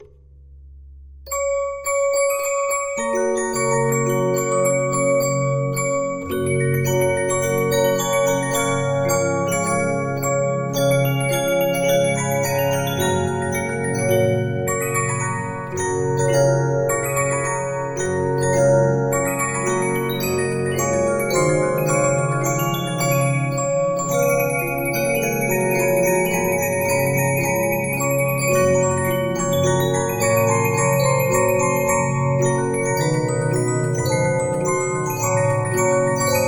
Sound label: Regina, 15inch disc musicbox